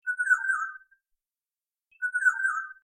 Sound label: oriole call